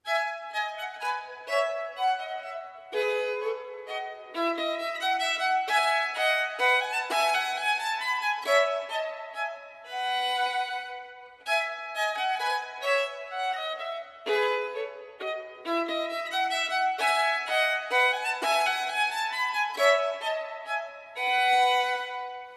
Partita No. 3 in E major - Menuet 1, Sonatas and Partitas for Violin Solo; Bach